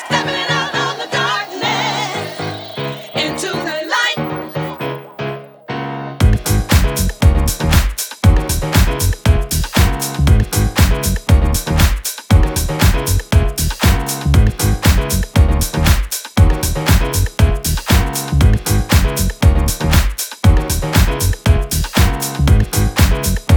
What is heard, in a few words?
Disco Piano